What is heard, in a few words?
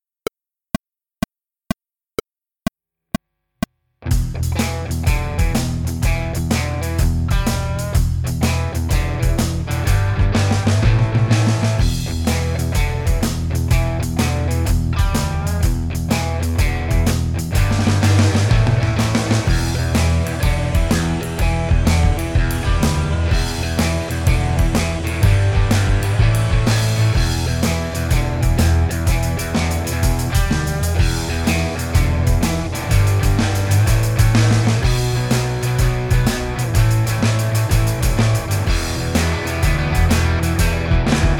With drums